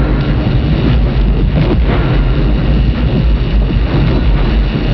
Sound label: walker